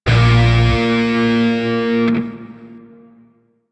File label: Shawzin Phrygian Chord L; Grineer; DE; Warframe